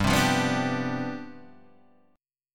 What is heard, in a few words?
C Major 7th Suspended 2nd Suspended 4th, G, chord